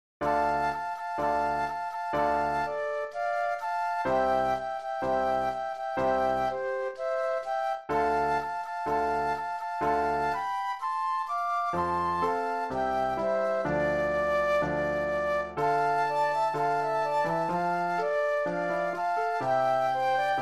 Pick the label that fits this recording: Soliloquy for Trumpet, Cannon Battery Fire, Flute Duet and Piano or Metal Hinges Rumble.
Flute Duet and Piano